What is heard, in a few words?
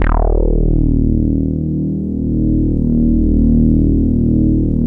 2600 bassics, vintage keyboards; Big Fish Audio; First Call Vintage Keyboards